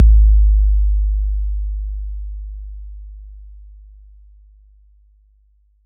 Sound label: BD 808 Decay